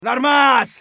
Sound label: scav cheer; eft